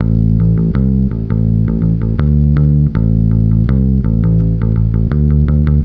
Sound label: BASS, BASS GTR